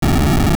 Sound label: Rock Push; biff bolton ep1